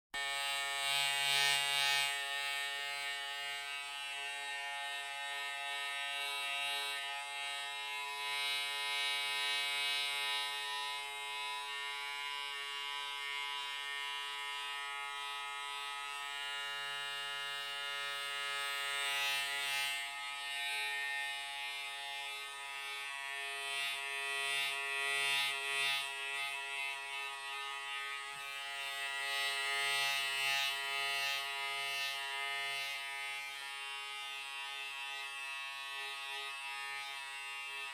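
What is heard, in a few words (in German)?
Im Badezimmer Elektrorasierapparat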